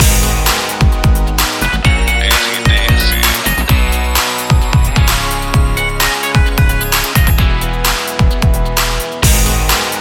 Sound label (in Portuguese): TRILHA PARA LOCUCAO FM; 107 FM